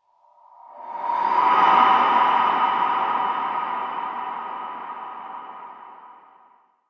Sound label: moss; Barotraumatic; Brainmoss1